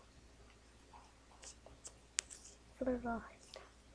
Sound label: flute